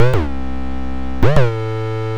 Bass Line; Eclectic Electronica

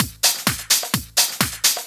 Clubhouse Loop 128 BPM

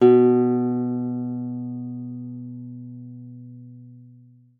B2, GUITAR; Xsample VOL-2

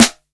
SN JAZZ

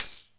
DM5 Perc, Alesis DM5 drum machine

